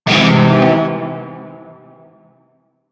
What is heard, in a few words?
Shawzin Phrygian Chord I; Music; Warframe; Narmer Shawzin